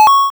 coin, interface; Oryx

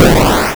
burn; Oryx

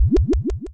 poison; Oryx

